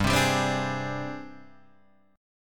Ab Major 7th Flat 5th G

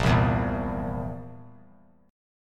Bb Suspended 2nd Flat 5th Bb down; strummed

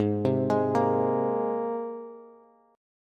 Ab Major Add 9th Ab Bass; Abadd9 arpeggiated; JGuitar

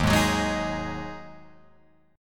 D sharp Minor Double Flat 5th, Guitar, Open F; strummed; JGuitar

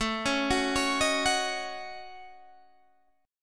A 6th Flat 5th, Guitar, DADGAD

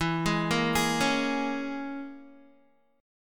E 6th Flat 5th E down Guitar; E6b5 arpeggiated

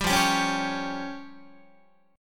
F Suspended 2nd Sharp 5th, Guitar; Fsus2#5 strummed; JGuitar

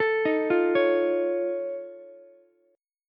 F Augmented 7th F Ukulele, chord; F+7 arpeggiated; JGuitar

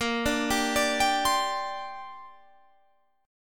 Bb 6th Add 9th Bb Guitar Half Step Down

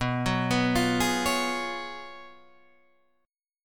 Gb Major 7th Suspended 2nd Suspended 4th

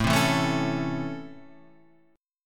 C sharp Minor Major 7th Sharp 5th, chord

